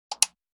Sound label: click; Post Game jam